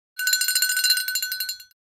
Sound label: Christmas toy shaking